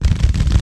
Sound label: Af Foots; Roland BK-5